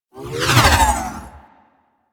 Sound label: Ball Projectile By; Warframe; Missions; Tenno Ball